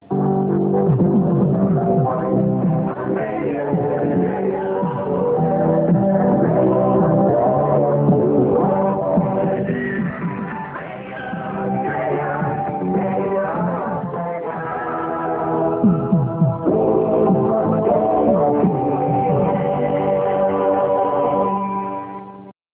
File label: jingle